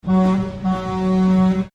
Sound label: Truck Hooter - Medium shot truck hooting; Trucks; Vehicles; Tom Hutchings